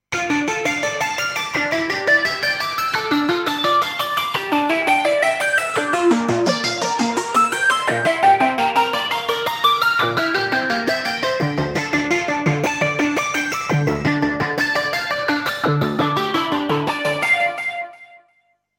NoisyPeggio

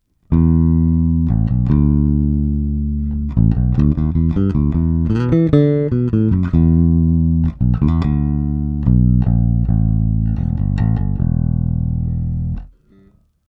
Block 800b Jbass neutral

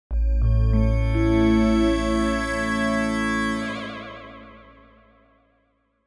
Vibreles

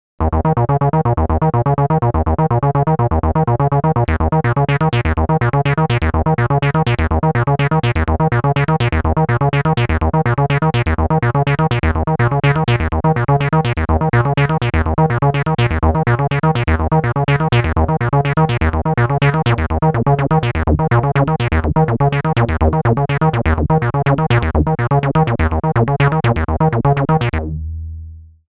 Filter Amp LFO Rate; Urzwerg; Nanozwerg

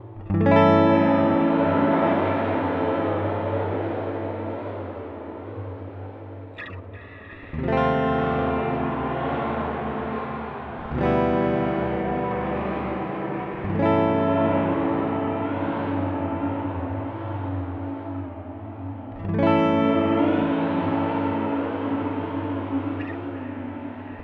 Amplitube X-Time Rev Pong

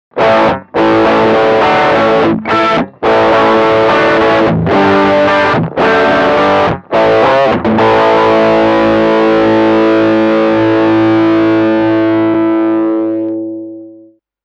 Guitar Crunch Amp Tube

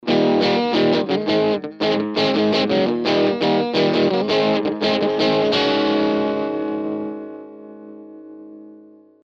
guitar amp british sound

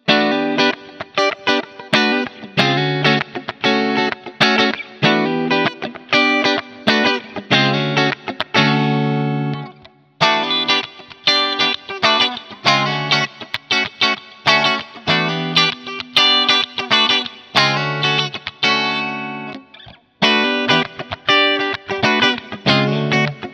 4 Pos. Neck to Bridge Alter Switch On; Ibanez AZ226

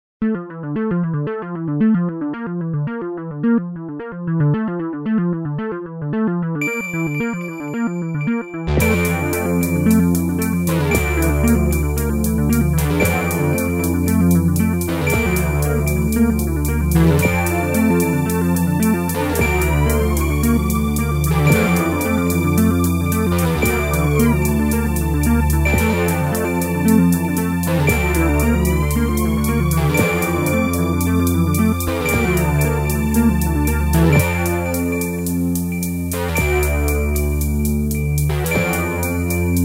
80s style

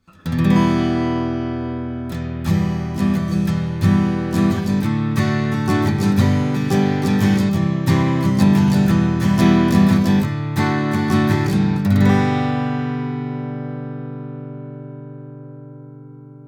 UR RT2 Neve Strumming Git Gain at 2 oclock

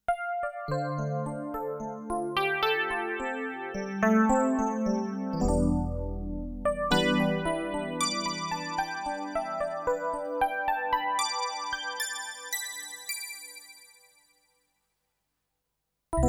E-Piano

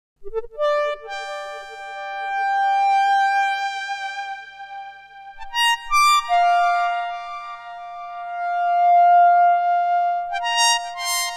Lead

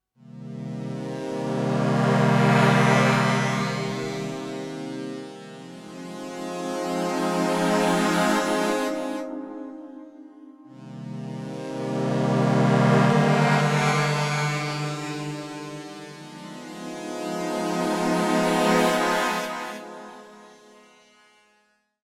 Cinematic Pad; Mayer MD900

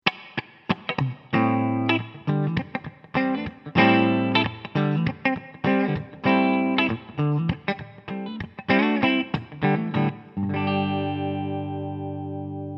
Clean

